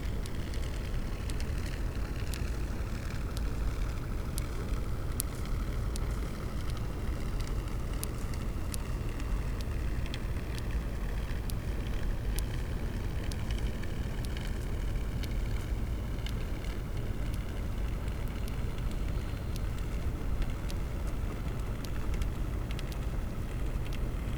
cachalot positif, Physeter Macrocephalus

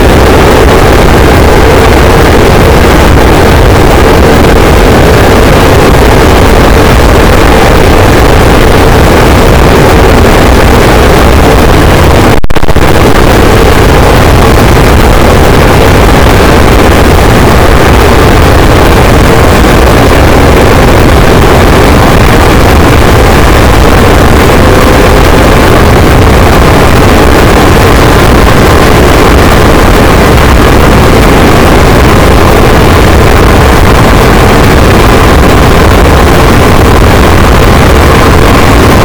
cachalot negatif, boat, Physeter Macrocephalus

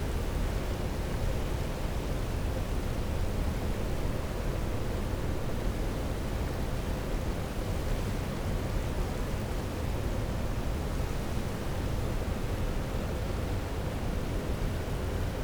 cachalot negatif, Physeter Macrocephalus

